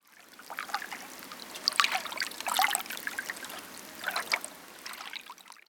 ambience canals water lap; Counter Strike Model Pack; CS:GO

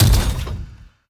construction step, strider; creatures; Half Life Model Pack

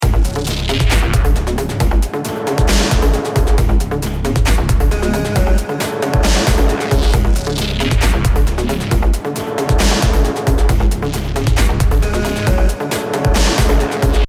start action, music

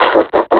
laugh, combine suppressor

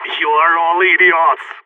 scared emote; Counter Strike; CS:GO; phoenix

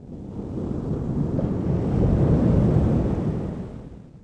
distant wave, water; Half Life Model Pack; Half Life 2

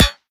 metal prop, bullet impacts; physics; Half Life Model Pack

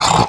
vox grunt, zombie revived; scripted intro; Half Life Alyx

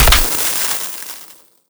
spark sparkles

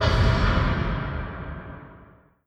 reset closed wet, combine plug

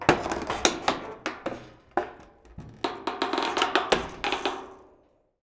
metal stress; ambient; Counter Strike Model Pack; CS:GO; survival

